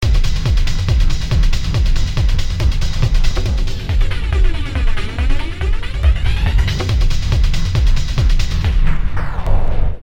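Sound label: Beats, minimal techno loop; V-Synth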